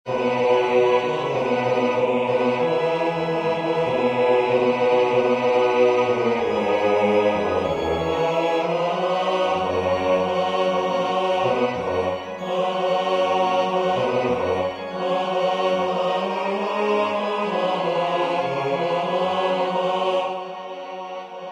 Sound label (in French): basse; Exultate Deo; Haydn